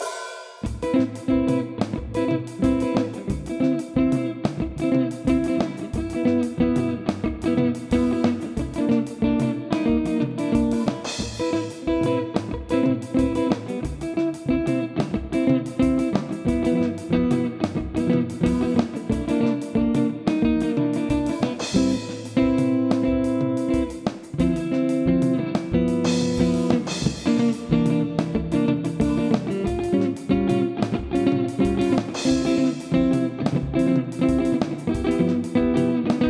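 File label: guitar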